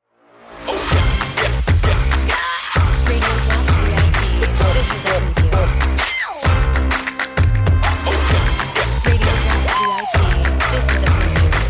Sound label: Music Bed small